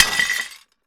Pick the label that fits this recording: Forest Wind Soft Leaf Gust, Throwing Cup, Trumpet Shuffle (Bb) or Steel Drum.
Throwing Cup